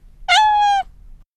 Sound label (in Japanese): calling cat, 何かを呼んでいるような猫の鳴き声。; かわいい リアル 猫 鳴き声; 呼ぶ猫（猫の鳴き声１３）